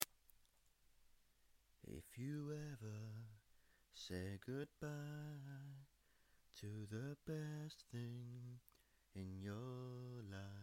short acapella

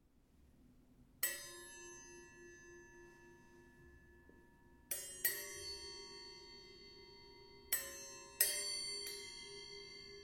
metal cups for eating dessert

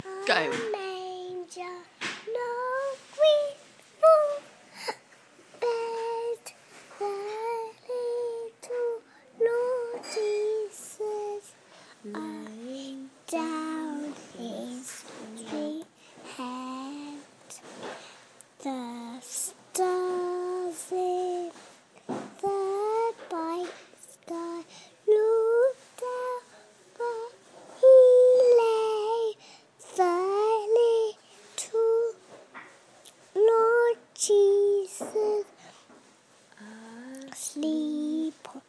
singing

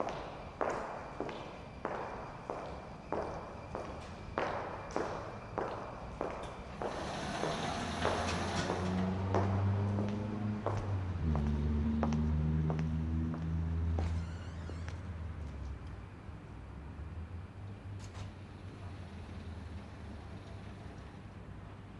sliding doors

